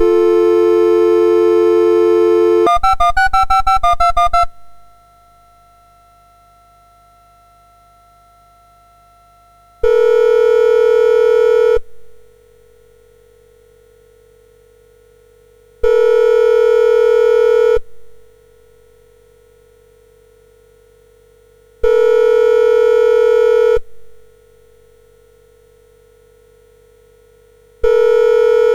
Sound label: Dial Tone, MOS6581R2; Sound Effects